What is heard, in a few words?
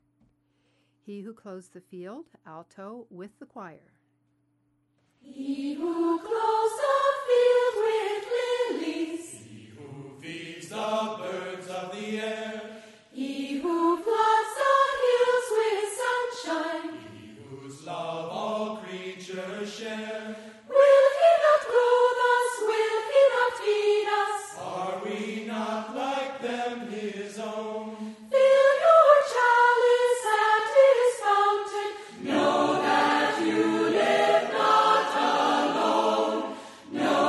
He Who Clothes the Field, alto w choir